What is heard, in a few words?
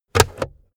car backseat table pull in cupholder quick hard